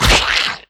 maggot enter; pestilence

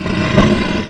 monster roar

